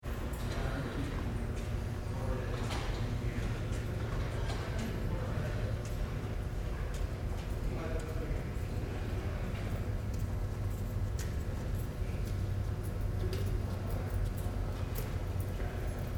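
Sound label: Large Hall College Fluorescent Light Hum Light Student Chatter; Tennessee; Building Sound Effects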